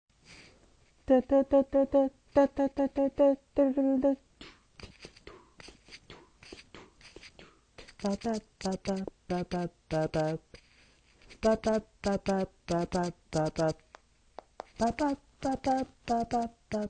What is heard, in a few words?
Acapella; Wii Shop Channel